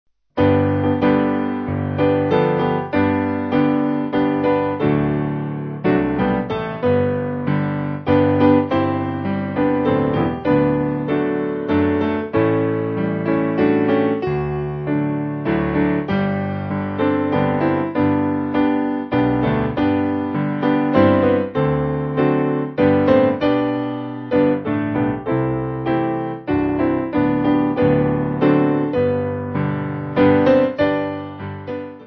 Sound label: Piano